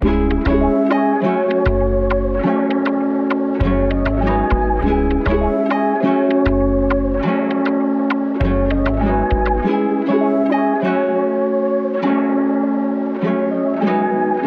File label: 100 BPM C# Min, Melody Loops - Afrobeat; Got To Be